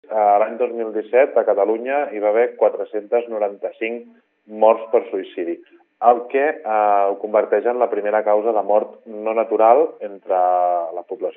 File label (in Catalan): Trilles